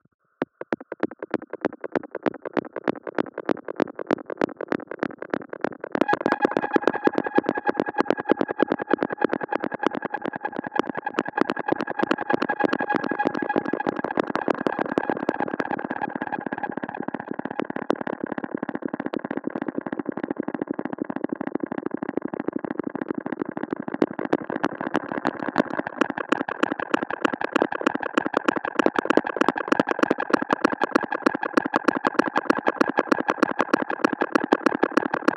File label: Bugs In My Ear, Ambient; SFX; Ambient - General